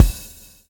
swing

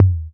tom, dance drums

